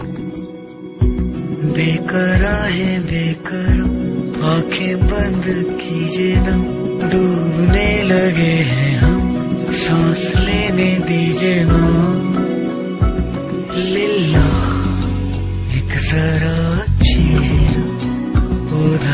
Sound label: Soft Tone